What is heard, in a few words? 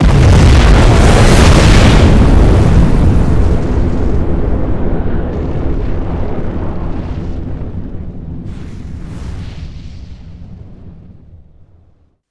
large, Explosion; NanoFXv2; ExplosionFX; ExpLarge